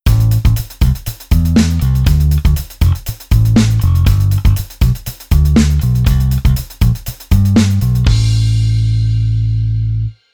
Bass Oct

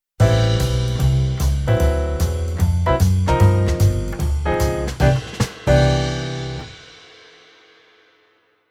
Keyboard Pf Jazz

